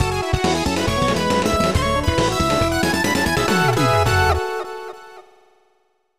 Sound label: Game Over; Spriggan Powered; SNES